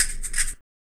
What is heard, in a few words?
RSS PERC